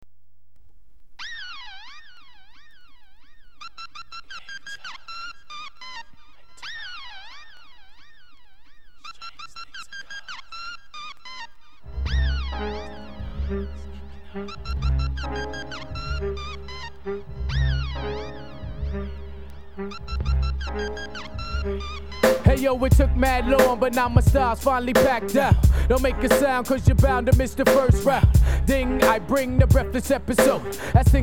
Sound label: hiphop